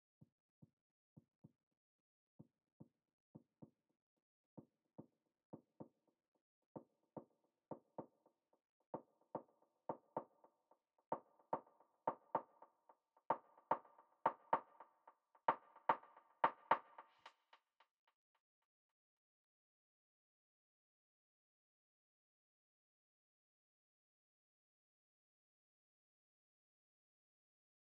Snares

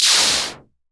Cymbal ONE SHOT

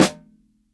snare cranked soft

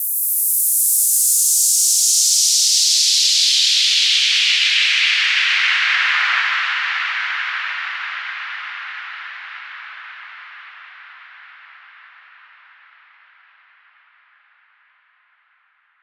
Sweeps

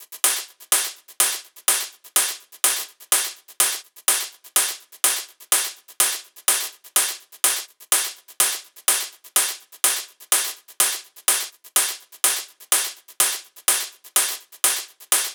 125 Top Loop

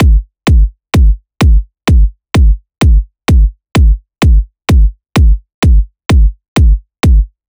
Drums Kick

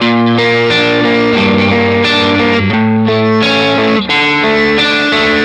Guitar Riff Dm 88bpm rock groove

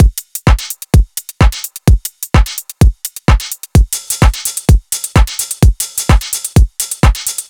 Gqom Drum Loop

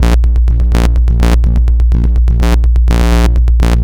bass loop 125bpm a

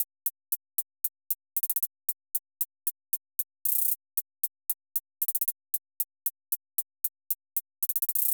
115 A HiHat

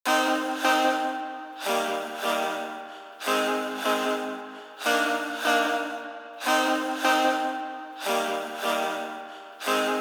Chord, 150 bpm; The Other Side

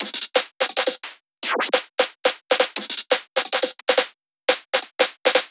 BREAKS, BREAK ARTIFACTS, 174

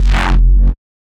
F- mid range twisted

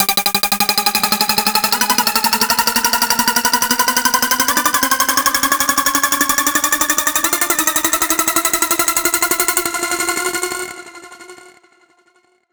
Riser DnB Jumpy

